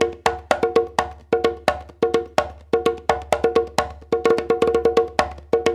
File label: 85 TAP, African Ethnic Drums; Spectrasonics; Supreme Beats